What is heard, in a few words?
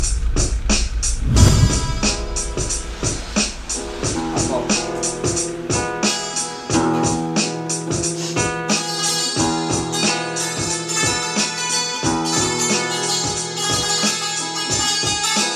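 santoor persian ensemble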